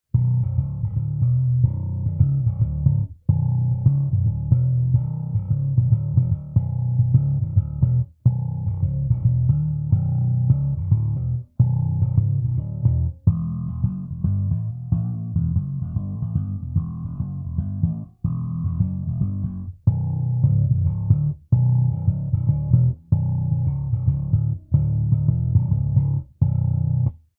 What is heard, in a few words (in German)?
Thump Mikrofoniert; Bogart